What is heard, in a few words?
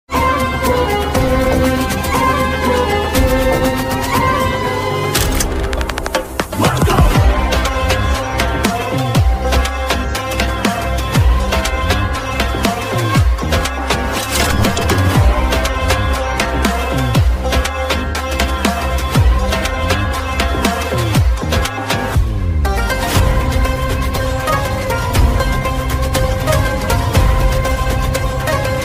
bgm mashup ringtone; marco x kgf; telugu